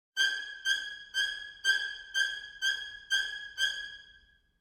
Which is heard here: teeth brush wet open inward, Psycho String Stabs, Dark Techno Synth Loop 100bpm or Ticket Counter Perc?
Psycho String Stabs